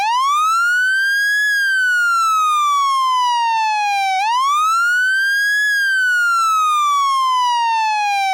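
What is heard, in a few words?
Federal Signal PA300 Manual, Siren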